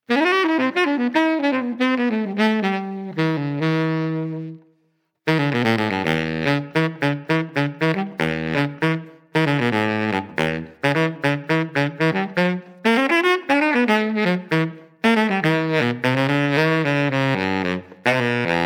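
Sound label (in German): Bari, Saxophon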